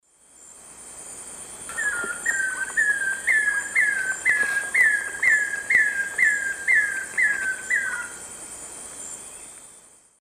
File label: Monasa nigrifrons, end of group song; S. America; Eastern Lowlands